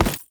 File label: Step Default, Foley, Footsteps; Warframe; Lasrian; Lasrian Soldiers; Lasrian Butcher